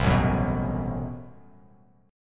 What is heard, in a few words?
A Minor 6th Add 9th A Bass Standard, Chord; Am6add9 strummed; 8,0,4,4; JGuitar